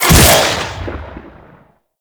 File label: Shackle Cast; Warframe; The New War; Deacons